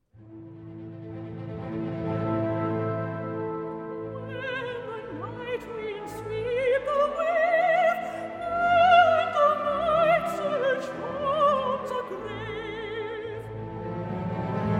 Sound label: night winds; lurline